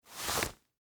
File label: Dress Pants Hand, Take, Hand, Out, Of, Pocket; Foley, Clothes, Pocket